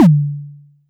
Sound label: Tom; TRX